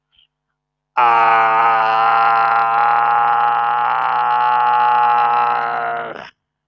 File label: Human sounds Human voice Wail moan, vocal imitation